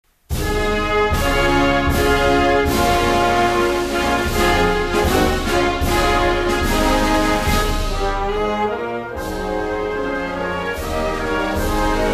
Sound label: Anthem of the Republic of Macedonia (Instrumental)